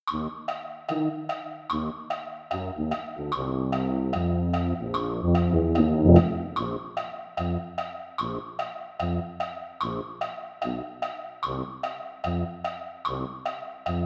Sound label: tuba; holiday brass